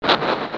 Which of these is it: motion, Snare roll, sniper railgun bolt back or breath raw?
motion